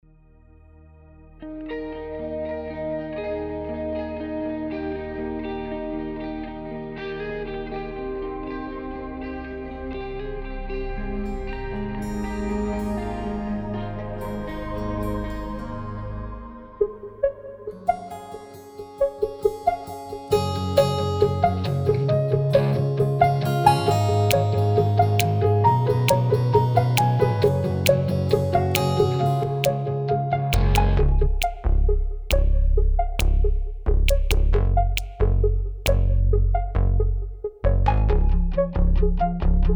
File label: electro acoustic Pop emo Comedy